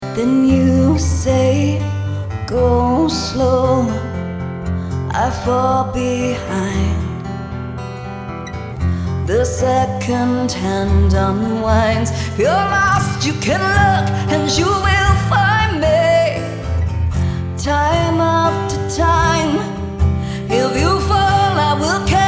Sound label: Singing Compilation